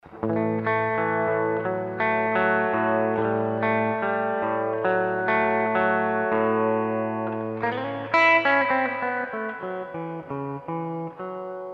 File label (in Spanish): Blackface, Equipo fender